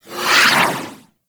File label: Sword Projectile By; Doom Sword; Warframe; Excalibur